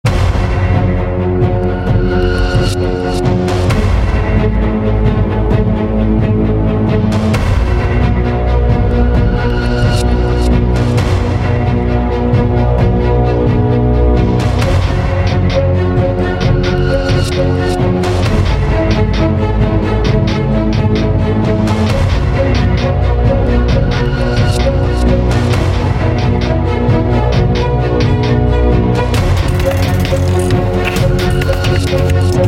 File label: music battle; dragon fin soup